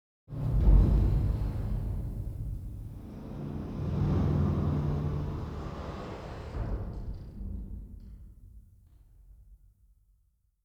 Tunnel Metal Closing; Tunnel Nuendo Metal Closing; inside

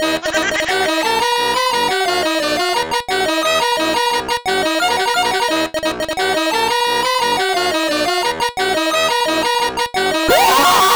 Game Over; 7quid; Windows; Trolled

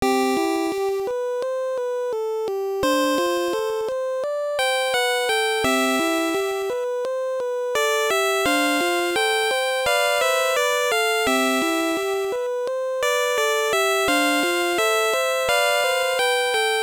Pokégear Radio -

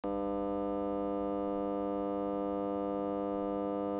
transformator; Pain Train

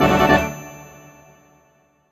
Jingle, New Region; streetpass mii plaza; 3ds

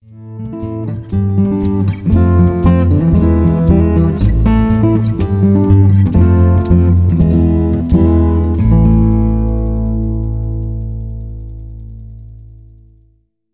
Music Light Stinger; starcraft 2 wings of liberty; MissionMusic

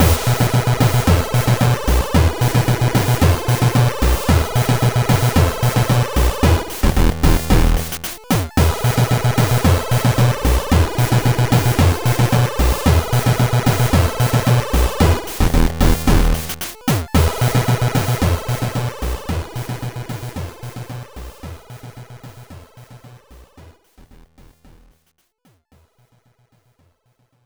Dance Room; Shantae; GB

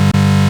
Bad Event; life is a game android

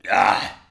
argh; Infernal Radiation; baal

